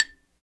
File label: XYLO, Afro Xylophn